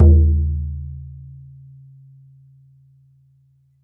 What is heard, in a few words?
BODHRAN